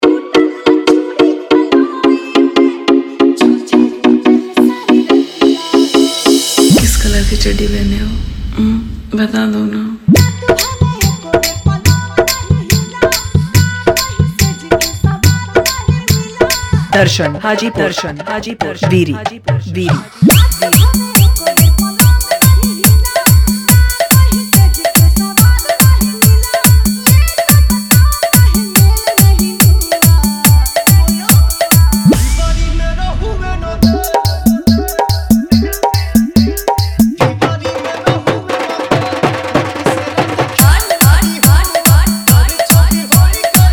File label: Bhojpuri Dj Remix Songs Hard Vibration Mix